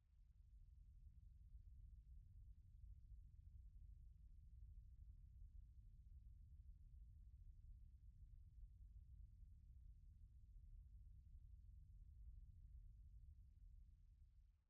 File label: outer space; noisepalette; sirenfx